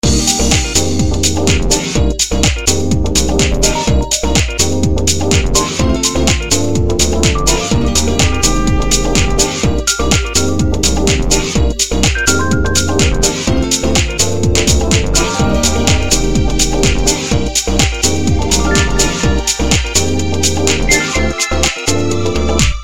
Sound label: Xperia S Ringtone